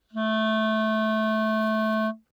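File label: Eb Clarinet fortissimo A4 stereo; Woodwinds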